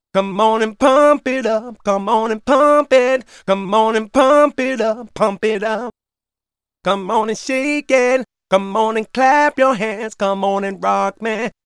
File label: autotune